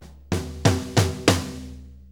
6-8 Fill, Drum; Drum Tools

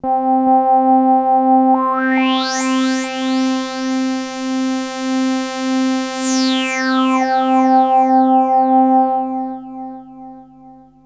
Korg Prophecy hits, arps and fx

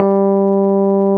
SUIT AMP G2, Keyboards Old School; USB Soundscan vol.09; AKAI

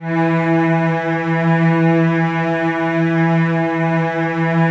E2, Strings; Virtuoso Strings; ILIO; Kirk Hunter Virtuoso Strings VOL-2; 90 s Sample CDs

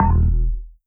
Stabs, Elektron MachineDrum

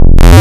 Classic Square, wavetables; Architecture 32

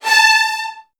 A3, VN 16TH, Virtuoso Strings; ILIO; Kirk Hunter

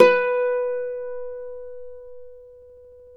Celtic Harp, Plucked Strings

